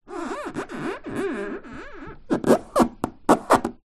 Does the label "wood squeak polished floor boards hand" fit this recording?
Yes